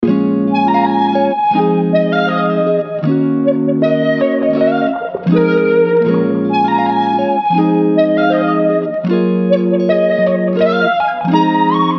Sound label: 160 BPM Bbm; Inhale